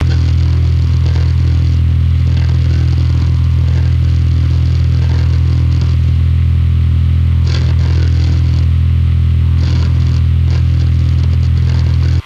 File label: electrical interference